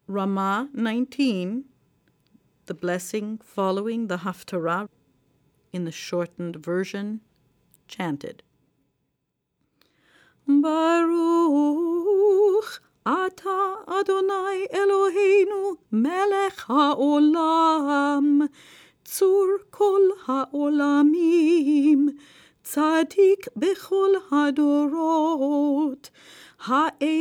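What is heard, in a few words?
blessing after haftarah short chant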